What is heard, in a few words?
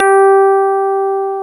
PNO FM, Piano Layer; Grnd Rds